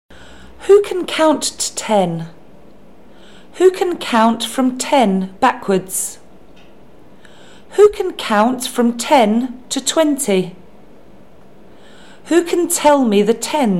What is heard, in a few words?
Who can count to ten